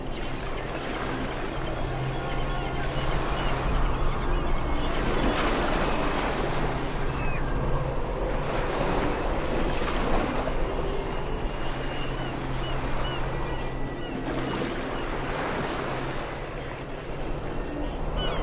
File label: Ambient ~ The Lost Island; rayman 2